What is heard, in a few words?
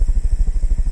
hoverboat pitch; There; ThereClient